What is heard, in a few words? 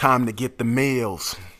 Rap Vox